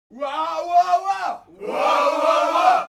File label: human crowd native american shout; smartsoundfx